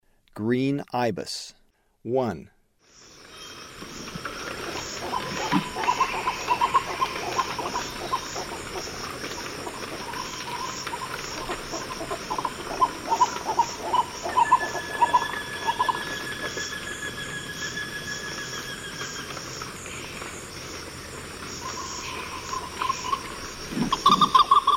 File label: Green Ibis; S. America; Eastern Lowlands